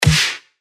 Snare; Dweeb; revealed claps snares vol 2

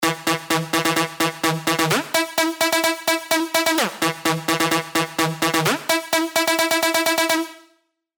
LD, dirty dutch leads; sylenth1; Gobstopper; revealed sylenth1 dirty dutch leads vol 1

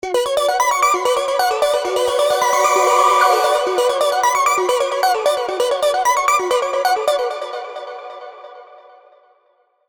Rhythm Space, trance plucks; alonso spire trance plucks vol 3

